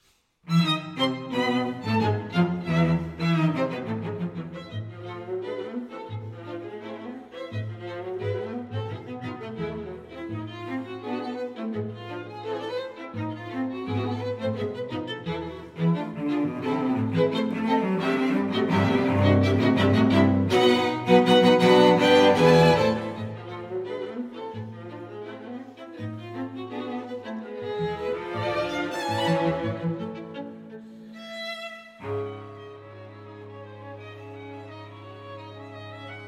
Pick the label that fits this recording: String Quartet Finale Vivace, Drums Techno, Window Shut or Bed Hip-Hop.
String Quartet Finale Vivace